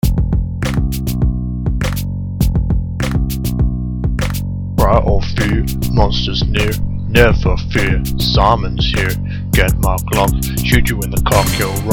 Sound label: beat